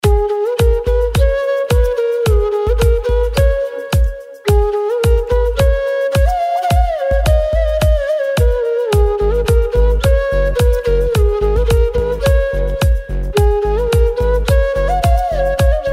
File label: Flute Bgm